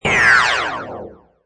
Magical Ray Blast Wah, Magic sound of casting spell, conjuring or wizardry; Witchcraft ritual summoning elemental or otherworldly; Blast Sound Effects; LynneMusic